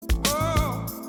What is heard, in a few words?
wooahhh